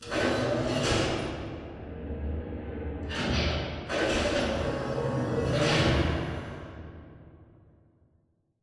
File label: Robot Arm Pass Right Pickup Dist; Boomtown; Titanfall 2